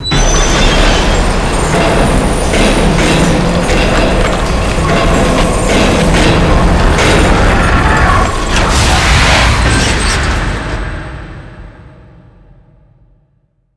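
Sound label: stalker train ride l-r; hl2 ep1; ambient; citadel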